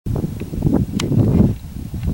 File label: Ravens Tok Tok Tok and Hawks, Coopers Hawk Kik Call; video by alice b clagett